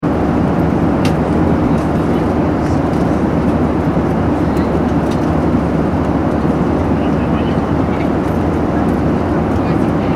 transportation aircraft commercial boeing 737 800 interior, In-Flight Ambience; Airplanes (Sound Effects:Vehicles); McKinney Sound